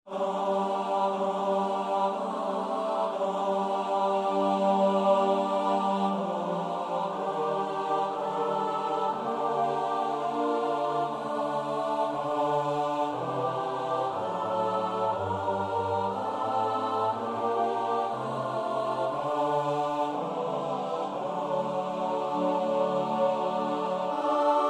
S-A-T-B; Nieuw Liedboek; NLB 932 (Rust nu mijn ziel); Vesperkoor Bunschoten-Spakenburg

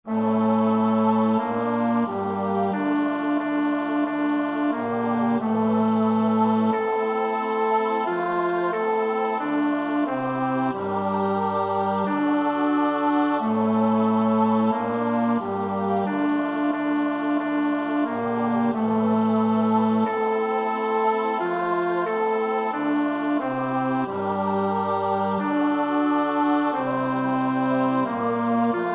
U Here Jezus roep ik aan (bas); Nieuw Liedboek; NLB 796; Oefenbestanden

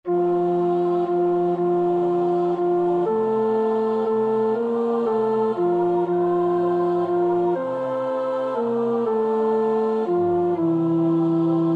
tenor; Nieuw Liedboek; nlb 975

